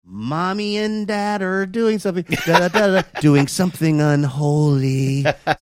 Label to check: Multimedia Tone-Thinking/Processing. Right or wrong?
Wrong